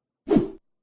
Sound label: camera shutter; Foley